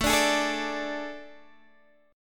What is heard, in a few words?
A Major 7th Suspended 4th, Guitar, Half Step Down